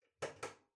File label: Ceiling Light (Far Pull)